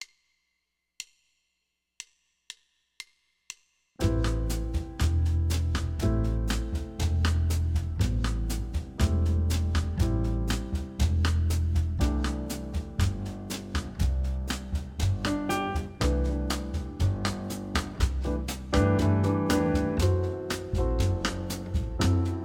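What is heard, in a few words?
Slurs and Things Backing Track, Jazz Guitar; FretDojo; Live sessions; Jass Guitar Warmup - Slurred Scales Resources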